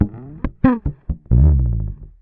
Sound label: Bass Slides, Electric Bass One Shots; Slate Digital BASSHOLE Sample Pack